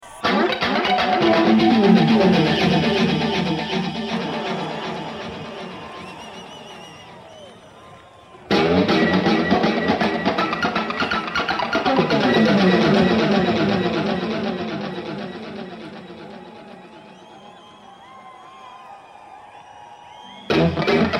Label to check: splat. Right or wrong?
Wrong